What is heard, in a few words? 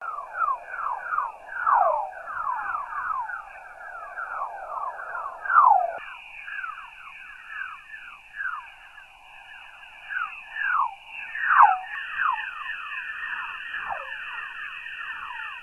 emfisis chorus